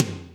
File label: Toms; Real